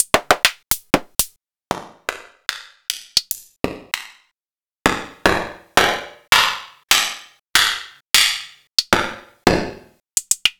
Short Hats

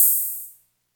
Hats, Elektron MachineDrum SPS1 MKII

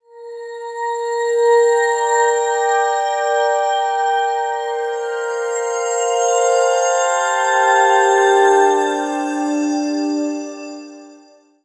MELODIC BELL TRANCE WAVE, SLOW SYNTHESIZER WAVES

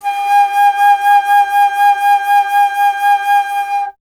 Alto Flute; Miroslav Vitous; Solo Instruments

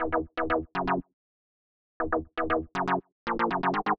Bass Loop (120BPM); musicradar

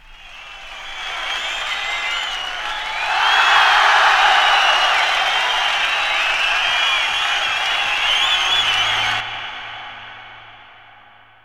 FX; AMG Megabass REMIX! VOL-2

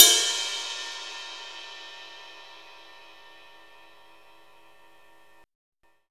Ride set, Drum and Bass; Ride Set 2; Instrument Samples

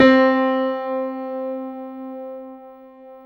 PIA MICRS, MICRO-PIANO

